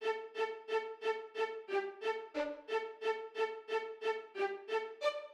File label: single strings stabs loop 90bpm A major, violins